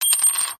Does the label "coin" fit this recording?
Yes